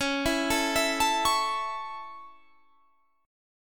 C sharp Minor Sharp 5th C sharp Guitar Standard, chord; arpeggiated; 21,19,19,21,22,21; JGuitar